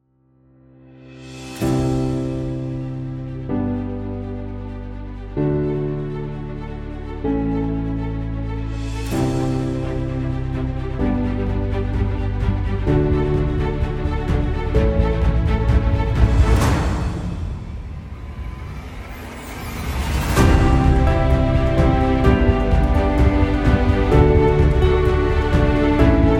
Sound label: Orchestral Heroic Epic Movie